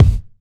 dry kick sample g key, kickdrum sound tuned to the G note. Loudest frequency: 199Hz